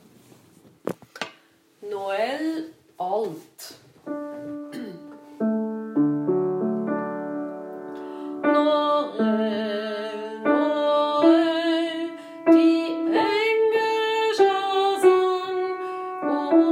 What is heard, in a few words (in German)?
Noel Alt